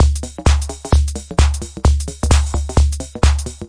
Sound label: analog berlin, drum loops and breaks; soundsonic nks